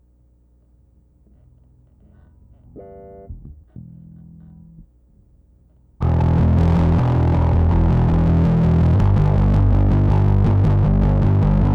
Bass Fuzz Comp

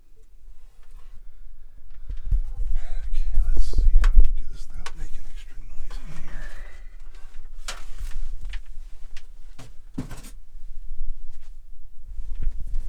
Label Printer Startup Feed

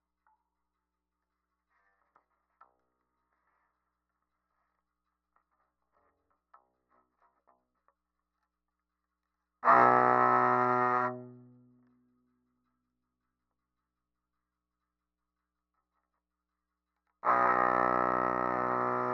Bowed Bass

